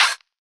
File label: soft open hat f key